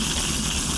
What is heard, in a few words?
turret rotate; triple-aaa-games